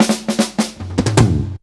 Rollin Stock, Drum Rolls; Zero-G Jungle Warfare 1, 2 & 3

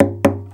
KNOCK, Nylon Guitar

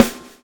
swing

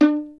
STR VLN, Solo Instruments; Miroslav Vitous

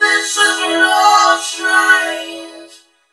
LOVE STRNG, VOCODER, Funky Vocals; USB Soundscan vol.18; AKAI

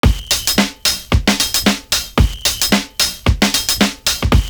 SONAR BEAT; Univers Sons - Kitch 70s

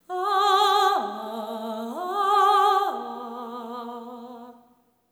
LAMENT

